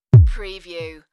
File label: Bass Drum Sngle